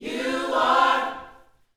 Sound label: YOUTH CH PHRASE, Vocal Planet Gospel